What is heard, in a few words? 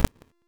Short Sword Perfect Wind Up; Weapons; Player; Swords